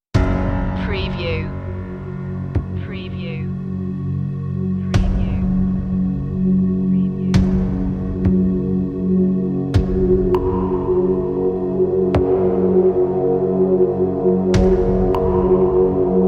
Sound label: soundtrack, suspense tension